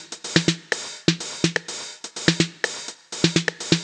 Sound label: drum verbe top 125, Drum Loops, Classic Chicago House